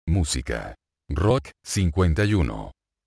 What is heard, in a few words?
rock